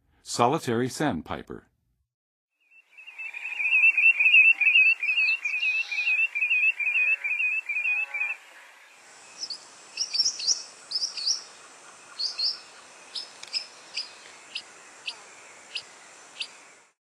Solitary Sandpiper; North America; Western USA; Western USA Songbirds

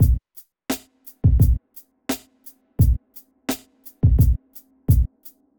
r and b 86

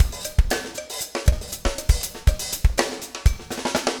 breakout 120, 120bpm loops

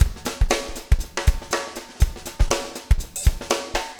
breakout 120, 120bpm, loops

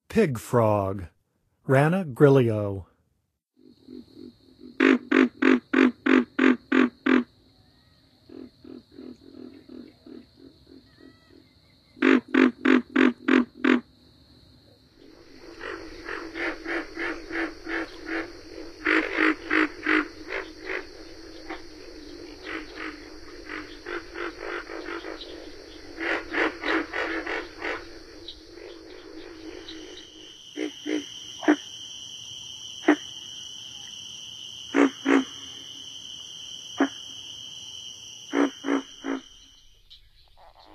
Pig Frog; North America; Songs of Frogs & Toads of North America

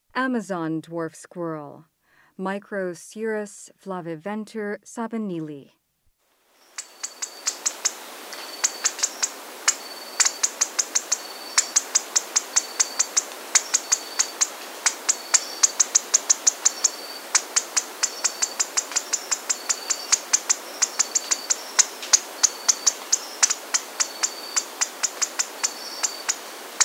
Amazon Dwarf Squirrel; S. America

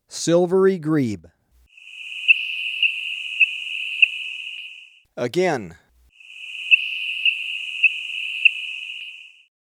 Silvery Grebe, Birds of Ecuador; S. America

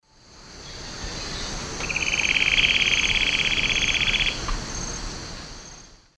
Conopophaga aurita, song male; S. America; Eastern Lowlands

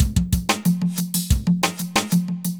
conga hop 92

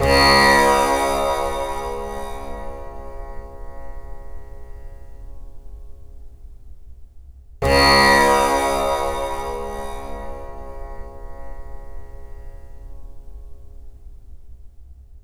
Synth, Rock

